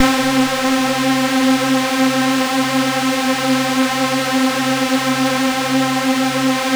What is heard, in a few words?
Synths C; Vengeance Samples